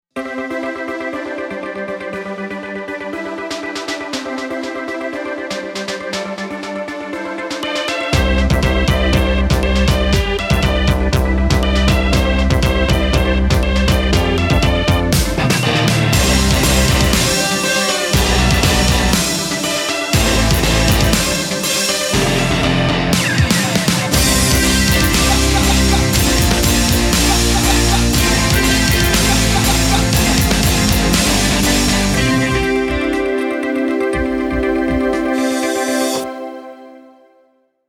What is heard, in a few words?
heavy rock